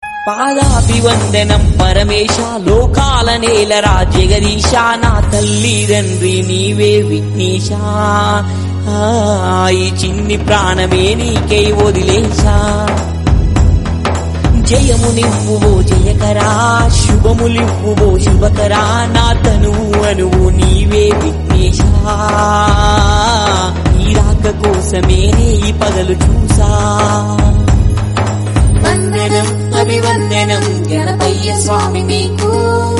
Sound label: telugu bhakti song